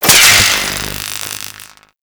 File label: Broken Light Zap; Warframe